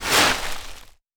Leaves

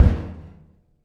Metal

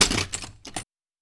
Glass

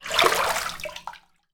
Shallow Water

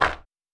Cement

